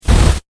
Science Fiction Flame Hand Booster Short Burst Dry; Spacecraft Sound Effects; SFX Bible 6